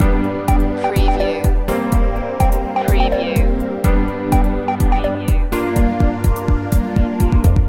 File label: groovy background loop